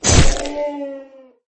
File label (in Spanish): caida